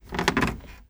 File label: foot wood creak, footsteps